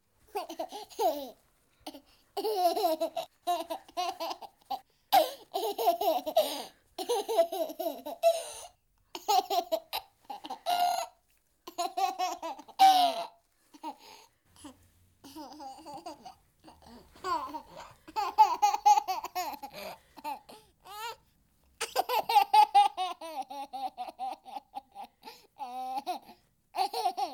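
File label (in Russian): мальчик 1 год смеется смех малыша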